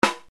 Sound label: RX7 RIM, Yamaha RX-7